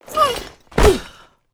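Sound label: death, monster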